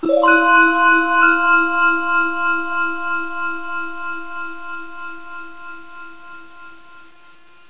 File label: mistery